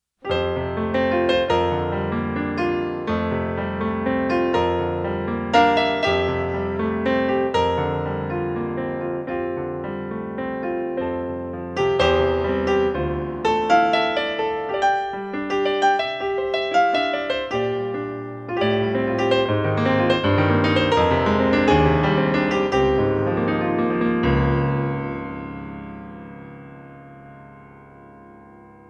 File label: piano